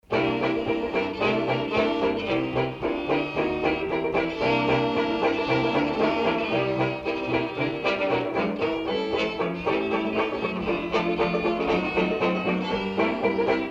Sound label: Violin